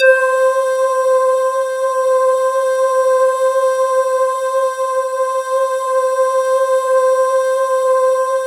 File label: BAMBOO VOC, KURZWEIL K2000